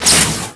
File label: ROCK